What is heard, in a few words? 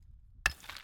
Concrete Hit